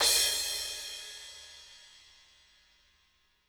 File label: CRSH, CRASHES